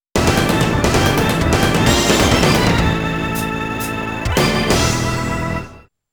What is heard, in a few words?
Crash Landing (Game Over); Stahlfeder